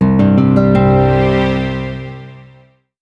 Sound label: Level complete; Rezrog